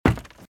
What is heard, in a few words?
Footsteps - Wood - Creaky - Run - Right; RadwinIsland